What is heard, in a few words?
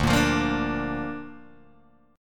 D Minor Sharp 5th D Guitar Open G